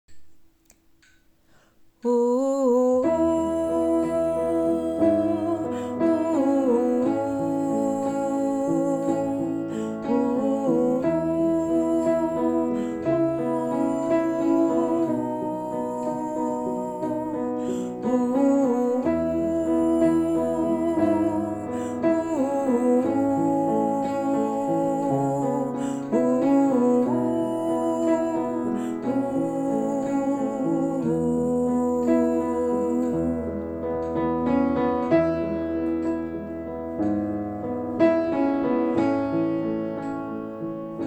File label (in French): chant dentree alto